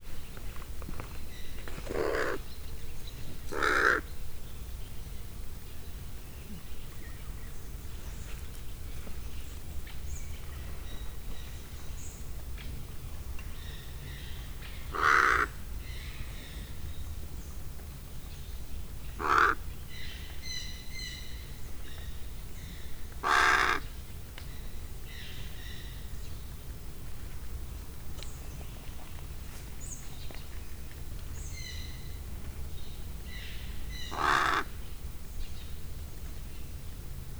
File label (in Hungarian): földön kerreg majd felszáll, emu, dolmányos varjú; miskolci zoo 2009